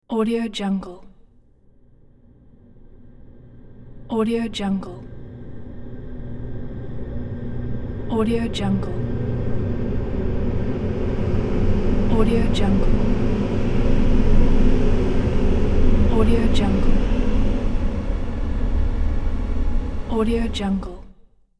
soundscape scifi machinery

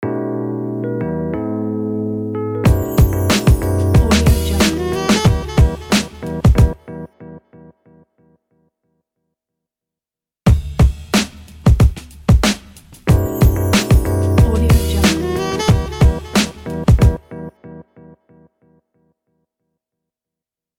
jazzy hiphop logo

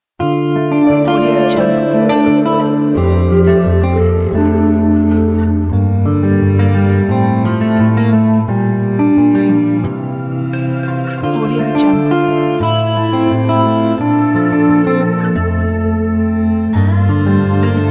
solitude and reflection

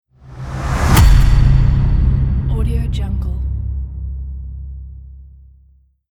epic trailer bass hit

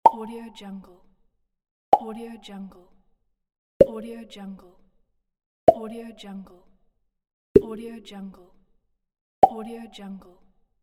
pop

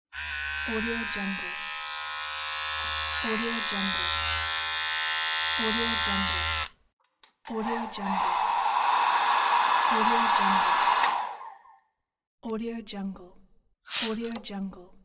barbershop; panchenko-olga

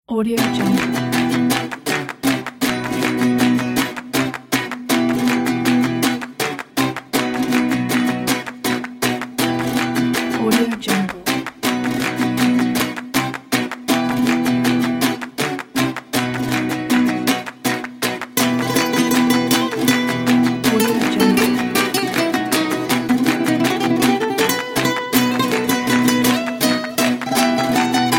spanish guitar flamenco; cogunaudio

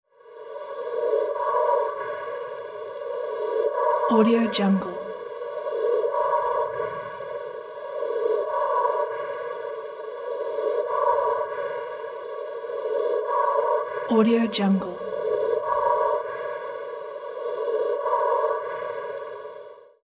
synthesized breathing

